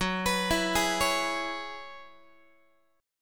F sharp 7th Suspended 2nd Suspended 4th, down, Guitar; x,14,14,14,12,12; arpeggiated